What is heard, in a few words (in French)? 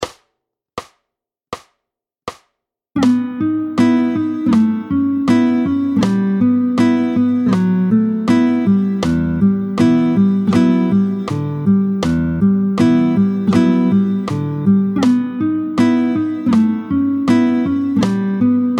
Corde glissante, tempo 80